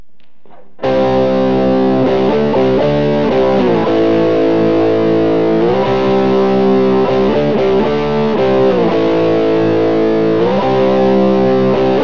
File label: neck crunch; Burny